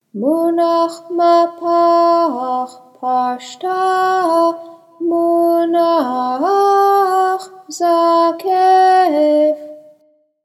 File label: haftarah munach mahpach pashta munach zakef, Zakef-Katon Phrases; haftarah trope